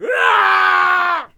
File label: Attack Vocals; Shield Bearer